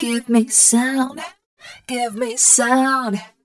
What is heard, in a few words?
f mel c, fem Vocals